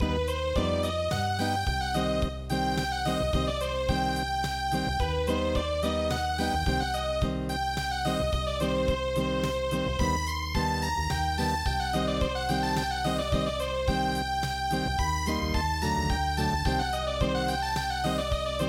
Zeybegi Unplugged

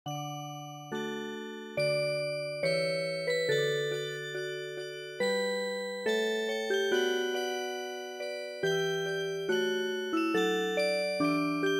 Music Box

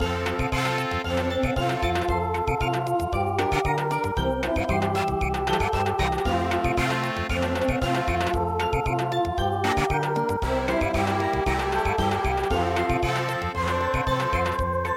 Samba, midi; traditional

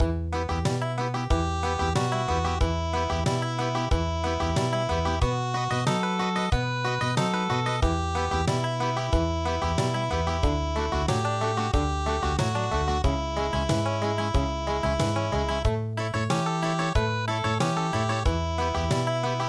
Country, traditional; East Virginia

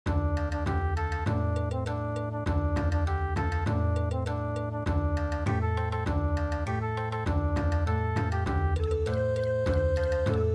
chonko bushi Bon Odori

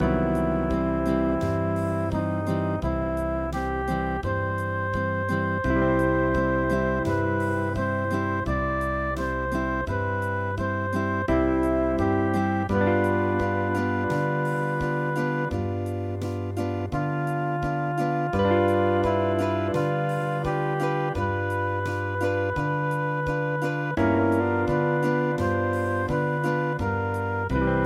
Hawaian, midi; Over the Waves

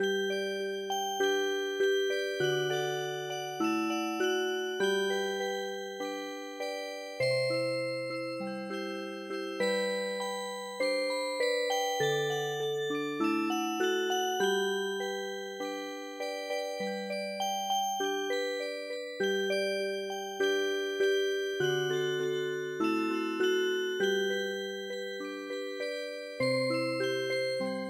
Music Box, traditional; HINE E HINE

